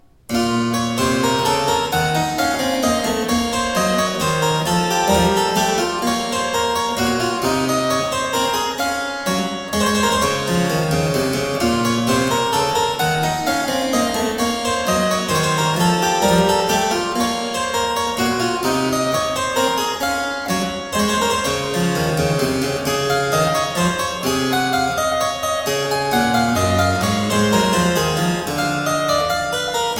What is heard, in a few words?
Suite in C minor Menuet; Suite No 2; BWV 813; Johann Sebastian Bach; JS Bach French Suites